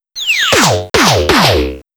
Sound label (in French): Chute bizarre